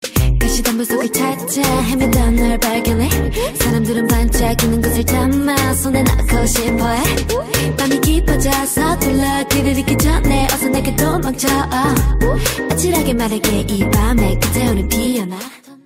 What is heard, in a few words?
Rap